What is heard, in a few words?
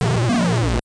hawk mouth; super mario bros 2 nes